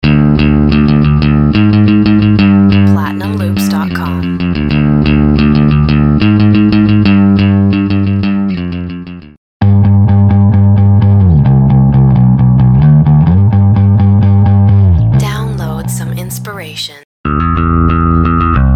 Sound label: nu metal bass